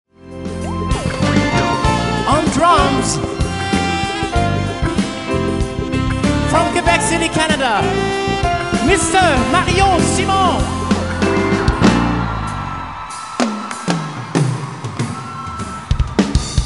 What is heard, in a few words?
Solo drum; Coree 2005